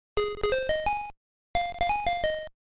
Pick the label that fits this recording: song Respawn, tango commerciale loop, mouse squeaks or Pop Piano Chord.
song Respawn